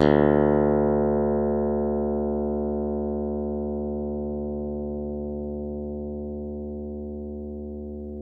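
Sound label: Yamaha open, Bass; Masterbits CLIMAX 7; PATITUCCI BS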